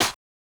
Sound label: Snare Hit, Bmin; Hip Hop